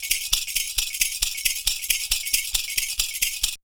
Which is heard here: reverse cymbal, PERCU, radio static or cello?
PERCU